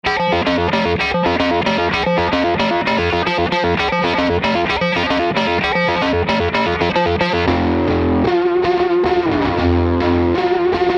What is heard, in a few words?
quadrature, mobius